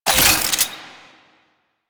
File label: Fulmin Prime Secondary Switch, Weapons; Warframe